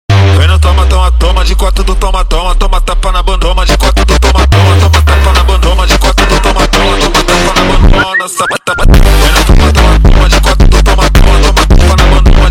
Slowed

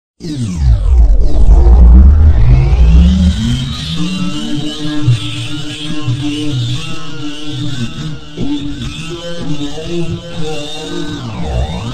Montagem superbass

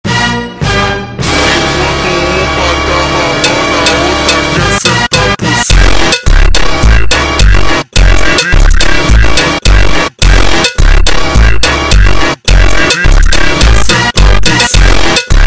Funk ultra Slowed

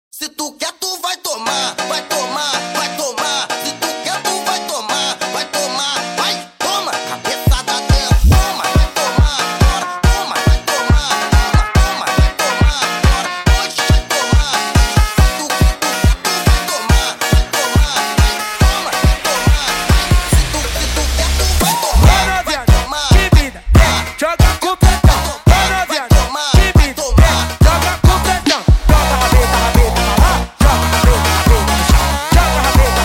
Funk sped Up

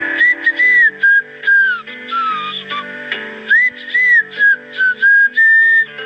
robin hood whistle, ringtones; Disney

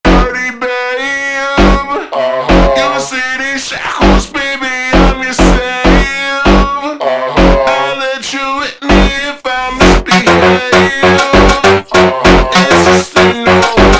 slowed

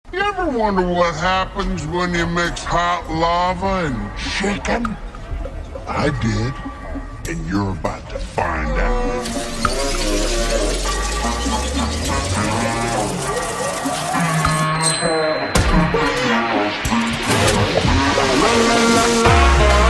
Funk ultra Slowed

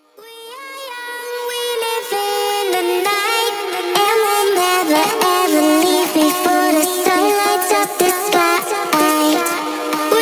sped Up Slowed reverb

